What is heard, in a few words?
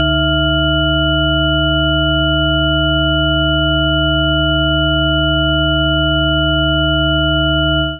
Minor Ninths C0-F#6; Notes and Clusters